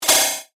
Multimedia Negative, Technology, Multimedia, Negative, Element; Alerts; BlastWave